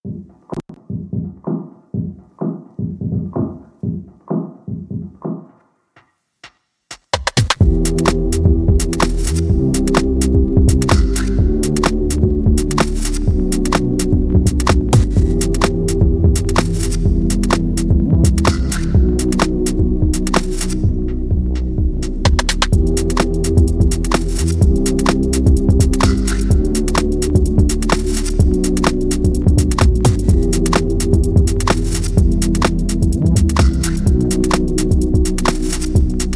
Drums; Emergent Drums

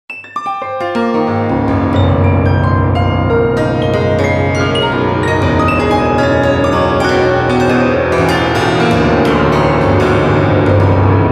Plucked; Nancarrow

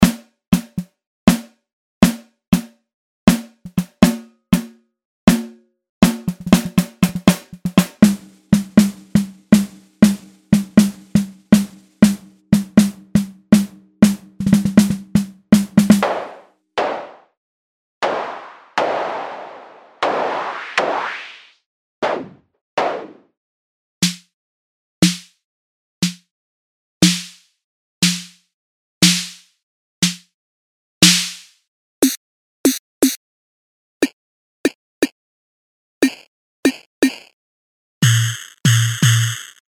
Solo Snare Module; MASCHINE 2.0

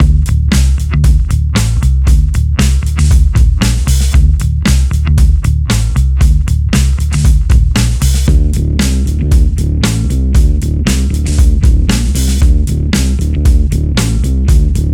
Bass Amp; Softube

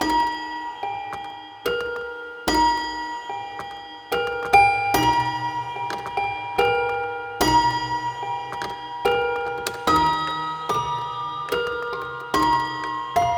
Toy Pianos, Toy Piano; Brahms; Soniccouture

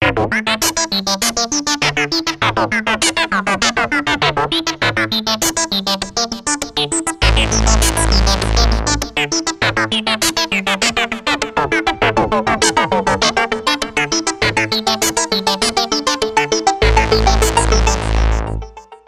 Buchla Easel; arturia

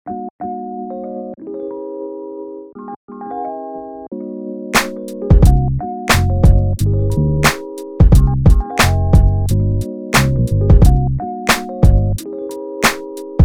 Groove

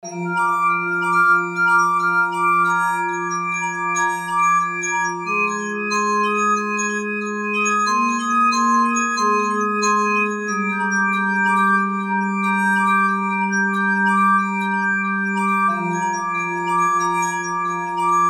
Glass On Glass

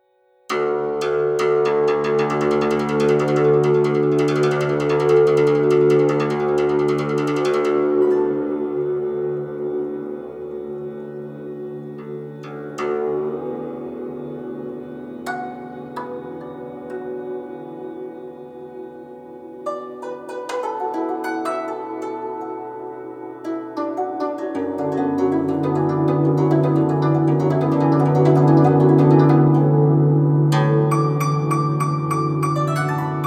Guzheng; Buried in ice; Soniccouture

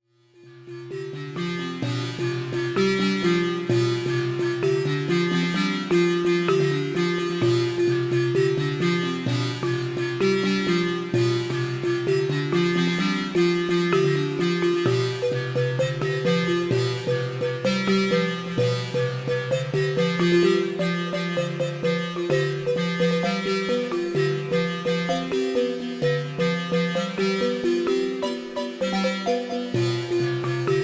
Wassoulou Balafon; Rain Forest; Soniccouture

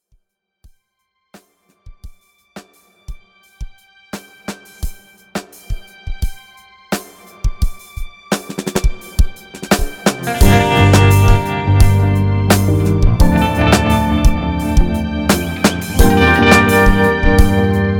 Ambient; Mushrooms

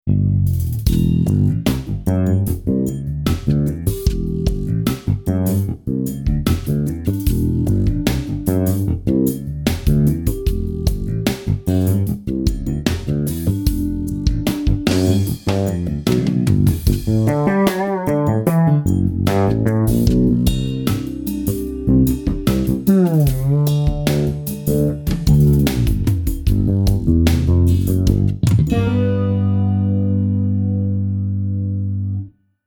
CHB Fretless

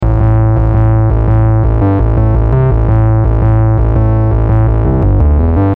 Fuzz Pedal; Substance

